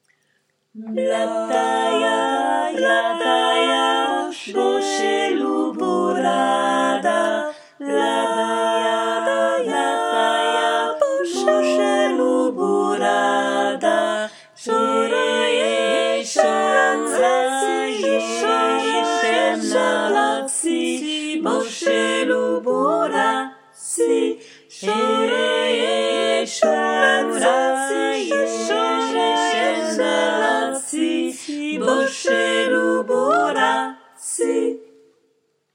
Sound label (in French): Pisnicka 4 voix